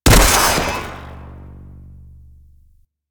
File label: Electro Mag Charged Shot, Weapons; Warframe; Corpus